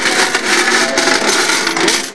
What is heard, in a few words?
CLUNK